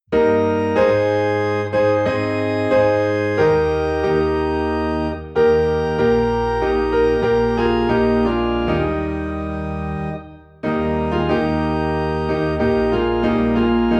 Piano and Organ in E Flat; O Day of Rest and Gladness

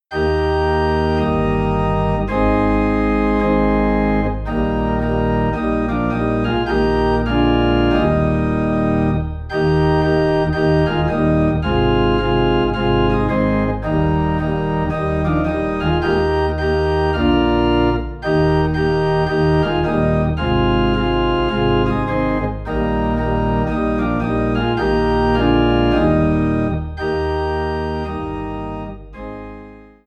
Organ Low in E Flat; Leaning On the Everlasting Arms

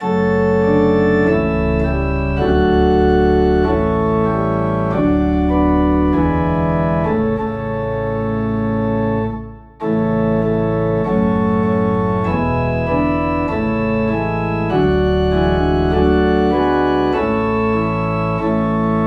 Organ Low in A; Holy Holy Holy

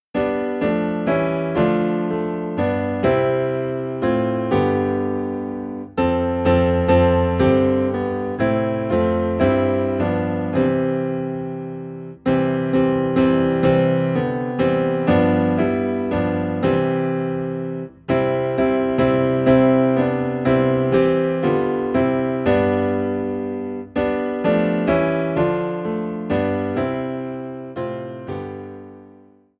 Piano Low in C; Sun of my Soul

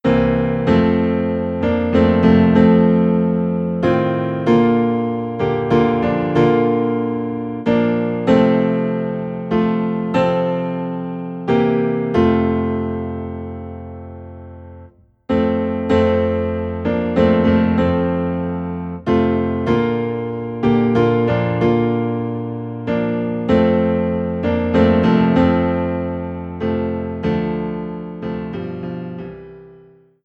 Piano Low in F; Hi Bb Lo F; The Comforter Has Come

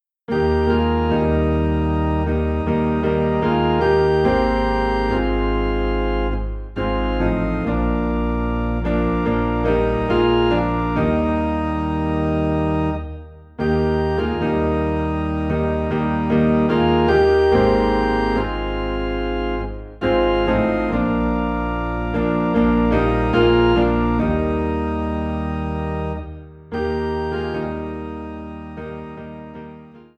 Piano and Organ in E Flat

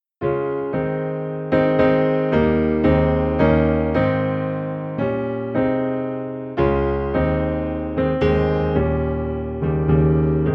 Piano Low in A Flat; He Hideth My Soul

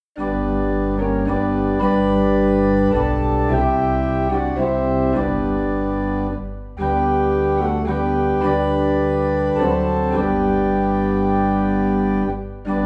Organ in G; Jesus I Come

